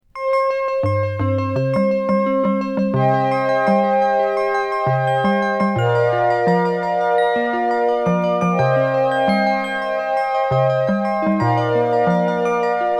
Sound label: glass bells; parry music library; video-tech 1